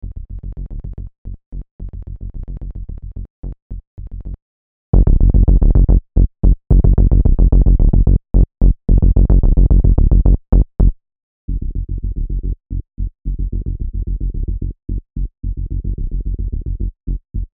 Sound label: Bass Presets; IK Multimedia Mixbox